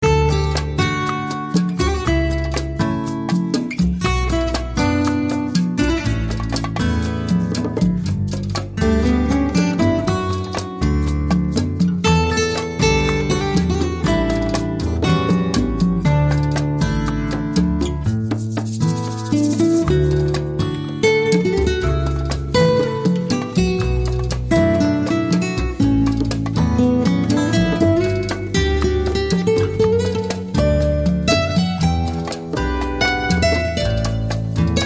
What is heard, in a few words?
Lullaby